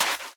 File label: sand run, step; Minecraft; Remastered